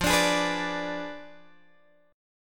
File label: F Diminished 7th, Guitar, Drop D